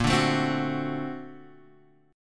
A sharp Augmented Major 9th, Guitar DADGAD

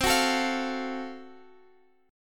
Gb Minor Major 7th Flat 5th, chord

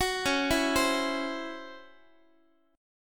C sharp Minor Major 7th Double Flat 5th, Guitar; arpeggiated; x,x,9,11,8,11; 4A,4E,5A,5D,5G#,6C#

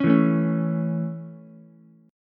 A Major, Ukulele; strummed

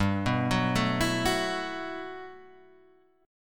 G Major 13th

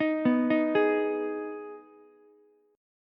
D sharp Suspended 4th Sharp 5th, Ukulele; arpeggiated; JGuitar

